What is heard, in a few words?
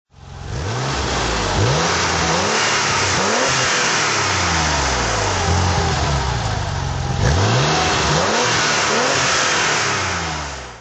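porsche 911 993 turbo s throttle; Porsche 911/993 Turbo S, 1997; Engine sound; V3D/ ClassicarImages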